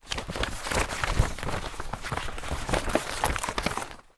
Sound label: paper pack loose sheets movement